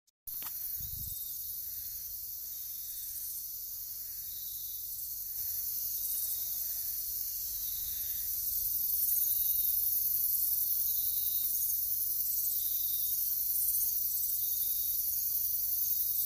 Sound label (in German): sound ecuador nacht